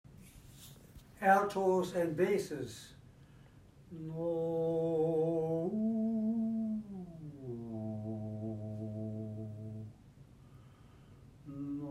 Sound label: Exercise Alto Bass, chorale rehearsal warmup